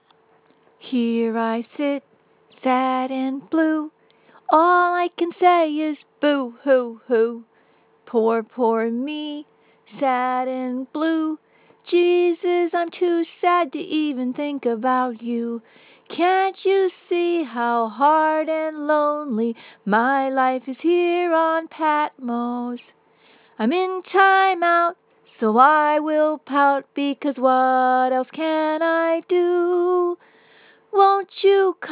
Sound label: pouting song